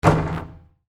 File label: Basketball Rim, Sports, Bounce